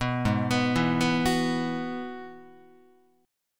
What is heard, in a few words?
Ab Minor 7th Sharp 5th Ab, down, Guitar, 4A, 4E, 5A, 5D, 5G sharp, 6C sharp; arpeggiated; JGuitar